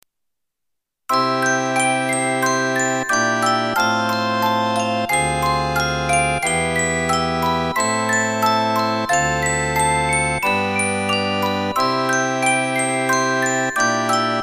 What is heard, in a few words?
handbell